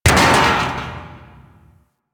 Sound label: Grate Fall A; Warframe; Lotus Intro